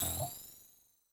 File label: AM Rhythm, Yamaha DTXpress-II